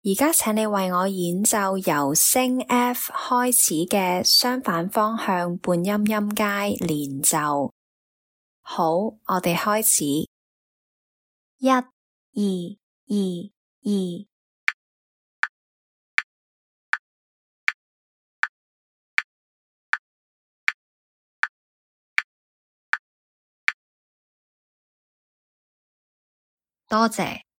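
chromatic contrary motion scale starting F sharp legato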